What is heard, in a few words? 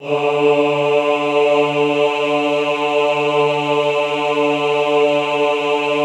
AHH D2, Classical Choir; Best Service; Extended Classical Choir; AHH MIX